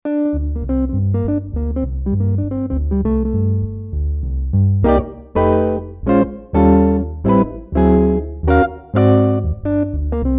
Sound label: Jazz Guitar Bass